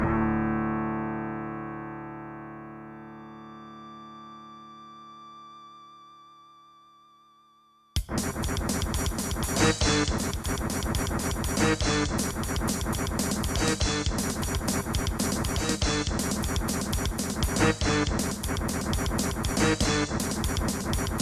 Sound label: sb awe32 ct2760; Creative SoundBlaster AWE32 ct2760; soundcard test; Doom